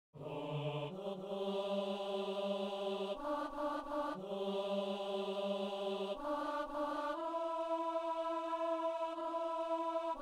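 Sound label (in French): ténor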